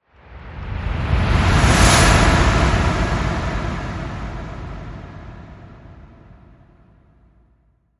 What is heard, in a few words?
Wonderland Storm Sweep